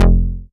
bass F1, Moog Minimoog

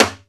MUTED RIM, Timbale; Timbale Battery

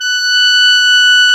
Clarinet

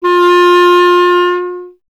clarinet F, Solo Instruments; Miroslav Vitous - Solo Instruments VOL-1; 90 s Sample CDs

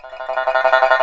SNXN TRML C4, Heart of Asia; Spectrasonics

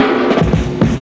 Fills, Roland; Sound & Vision - Gigapack I; Perc

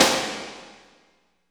SNR, Tekno Industrial; East West

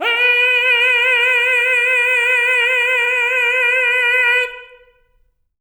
TENOR F B4; Spectrasonics; Symphony of Voices

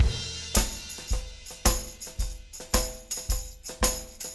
110 bt tam

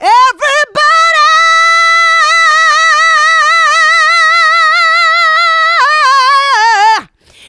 Female Vocals; Houseworx

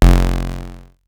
bass stabs; FutureRetro FR777

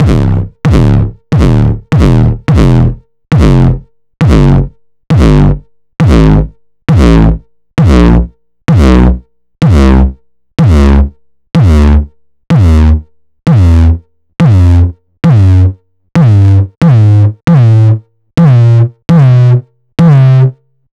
909 Dist Reso; Mbase11

